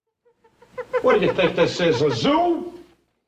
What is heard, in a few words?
zoo